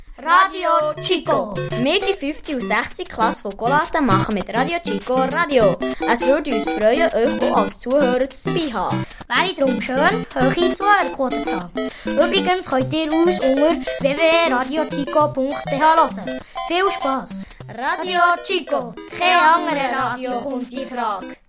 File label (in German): ankündigungs jingle; Golate